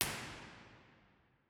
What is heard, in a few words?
binaural, lady chapel st albans cathedral